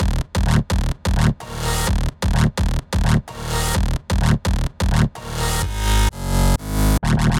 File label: Bass